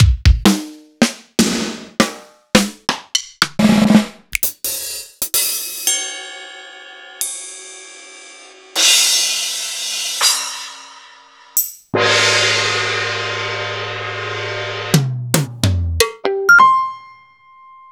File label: Jazz Trio; proteusvx